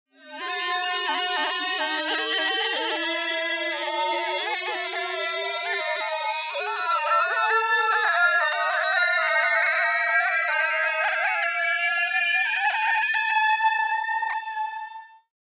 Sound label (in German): verfall gliss arab